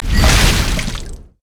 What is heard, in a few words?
sonic wave; leesin skin05